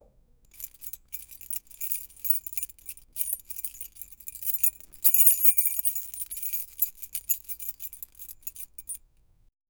a key jingling; rattling

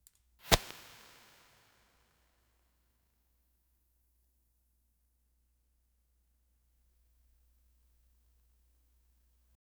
match strike and burn

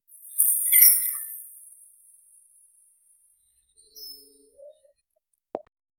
magical chime sparkle, kitten

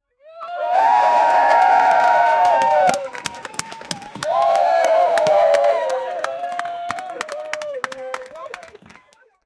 peoples cheering, party

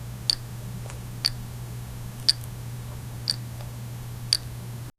soft clinking of spoon on; giggles

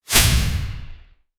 high fantasy light sword; earth

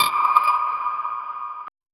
dark ambient sound effect; selection

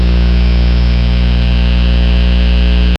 electrical buzzing loud

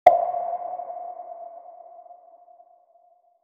a little brink reverb wit, plop

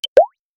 ui, selection

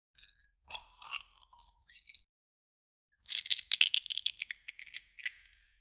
a guy biting gold like; hard

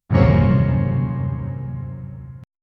short dramatic sting

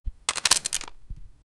sound effect in a game, item

